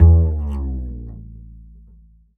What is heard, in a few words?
Upright Acoustic Bass